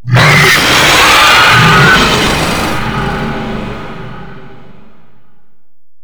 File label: Golem Attack Roar, Vocals; Enemies; Warframe